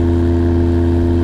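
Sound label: engine exhale, advanced engine sounds; engine2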